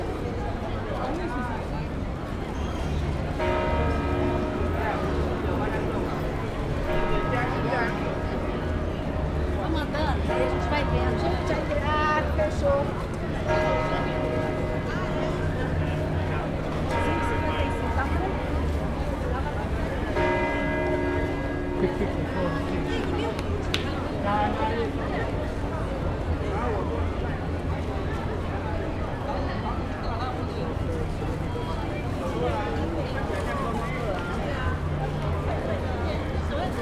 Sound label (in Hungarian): ez is az óratorony, Szent Márk tér, harangozás; olaszorszag; velence